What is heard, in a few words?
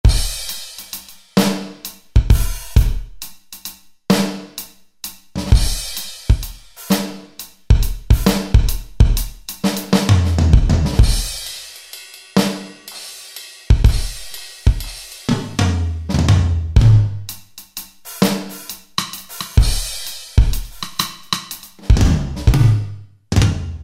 Medium Rock Kit; Northstar Productions Drumscapes Vol.1